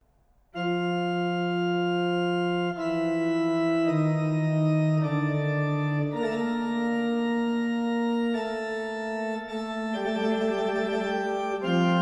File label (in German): Fuge in F-Dur; Toccata und Fuge in F-Dur, BWV 540